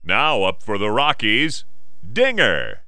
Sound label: kids; Colorado Rockies 1998 Opening Day CD